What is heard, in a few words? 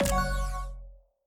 level upgrade happy